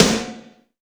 NASTY SNAR, Drum Mashines, SR-16 DRUMS; EdgeSounds

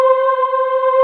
VOICE JD800; Best Service Gigapack 1

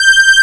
PA, ANA PAD

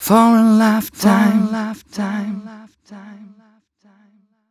m 129 mel e, Vocals

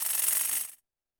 percussion, Roland TD-20

